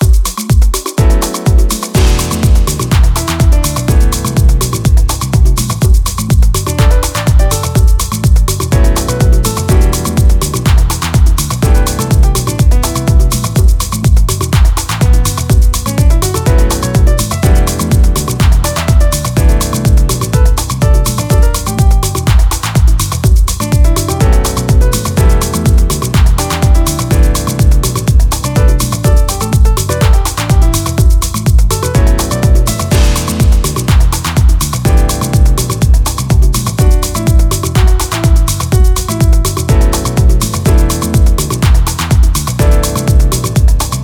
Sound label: (Piano Instrumental)